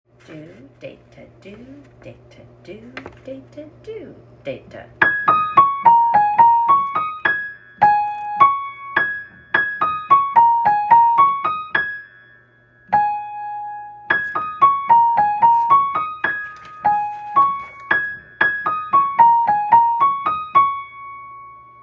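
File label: Fanfare, Primo, Piano; Fanfare by Vandall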